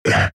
Monster Grunt; The Binding of Isaac

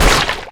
Enemy Water plant Tentacle Extend; Se Enemy Waterplant Tentacle Extend

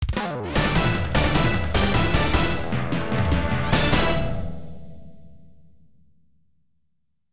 Stage Clear; Bulk Slash; Saturn

